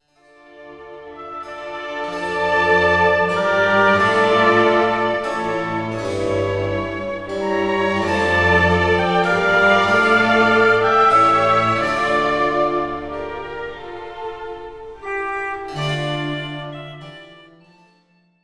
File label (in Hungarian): B dur Orgonaverseny; Handel